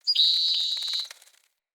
Fey Flock Attack Dive; Powers

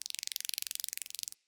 Prairie Day Close Cricket

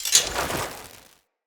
Casting Device Equip; Weapons; Player

